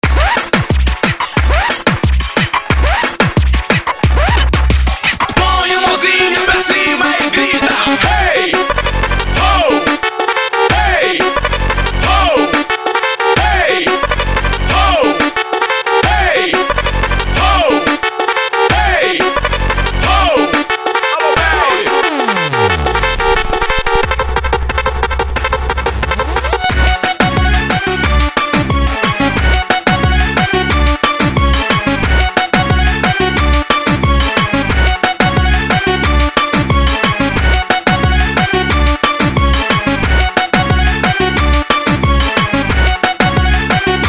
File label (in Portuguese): TUBA GÁS